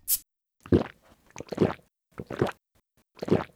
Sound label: beer bottle, eating and drinking; gmod